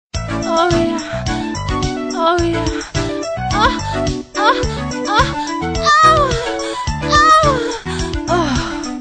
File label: Aaaaaah Ooooooh